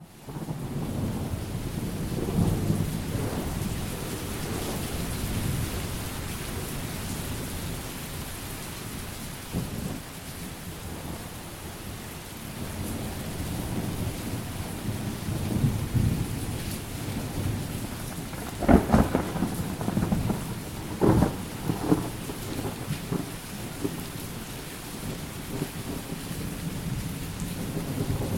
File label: thunder